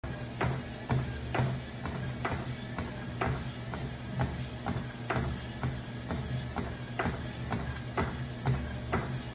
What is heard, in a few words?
treadmill